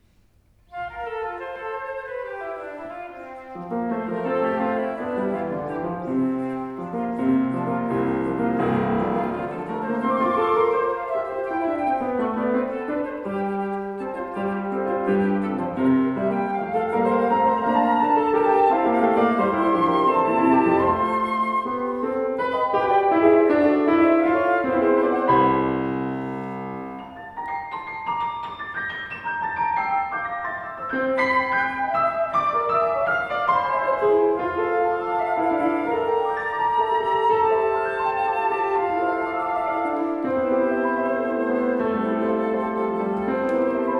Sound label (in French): flûte; Pièce brève sur le nom de Francis Poulenc; piano